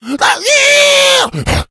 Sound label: rage at victim, infected, male; Left 4 Dead 2; Source Engine